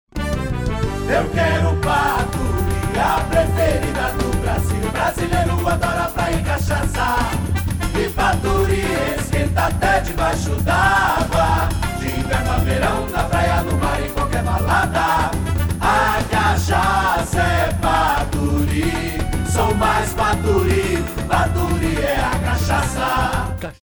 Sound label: Jingles; Instituicional; Cachaca Paturi